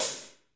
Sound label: HH hh; Best Service Gigapack 2